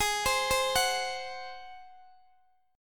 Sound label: Ab 7th Ab down Guitar Open D